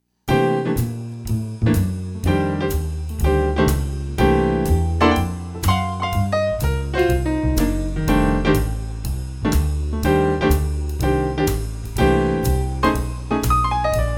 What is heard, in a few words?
blues, Piano